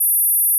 Gauss Filt A5

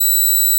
Gauss Filt B7

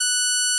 Gauss Filt F#6

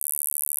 Gauss Filt D2

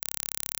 Gauss Filt C#1

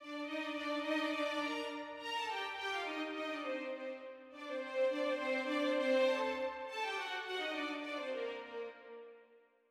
ORCH REDUC piano; Mozart; Symph40 K550; Out of Context

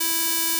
Odd Increase E4

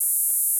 Gauss Filt E4

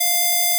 Odd Increase F5

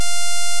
DC offset Wave F5

